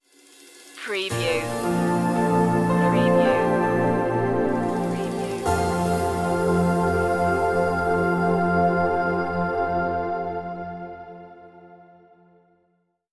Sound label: mystic piano stinger